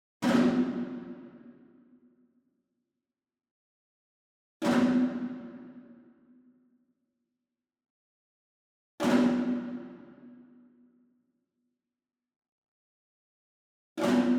ENSEMBLE DRUM Shime Daiko And Chinese Tom Flam Far; boom library; Cinematic Strikes Construction Kit